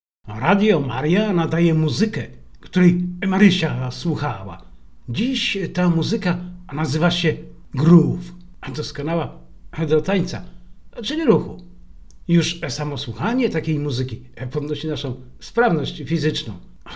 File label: Groove